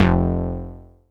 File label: Power Bass; Big Fish Audio; Old Skool House; OSH Bass